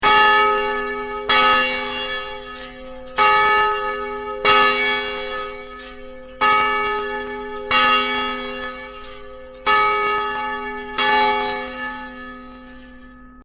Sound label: hathorn bell